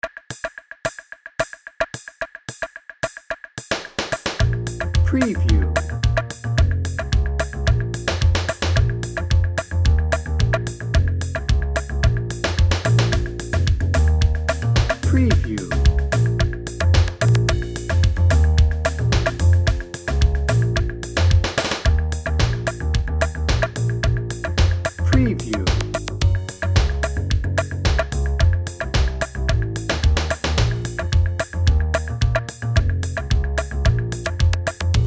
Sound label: samba shoes dnb